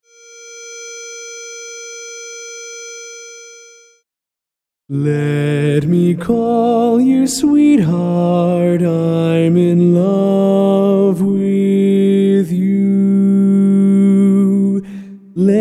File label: Lead